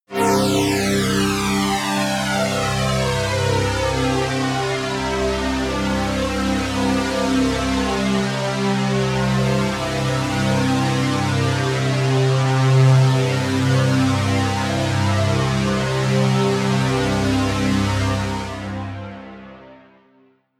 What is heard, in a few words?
pad sweep flanger hard; flaechen